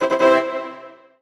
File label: PUZZLE Success Brass Fanfare Bright Wet; stereo; Universal Sound FX